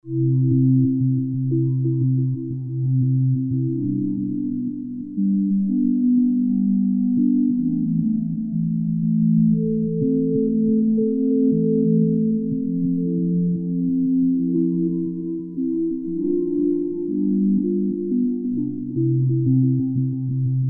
Space Lullaby

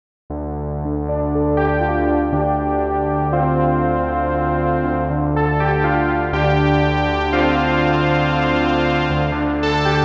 Arturia SQ80 V FilterVel

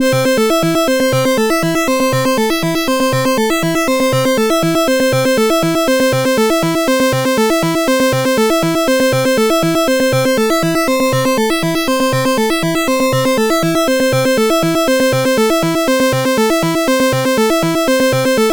Moogerfooger Synth Waveform Modulation Submix

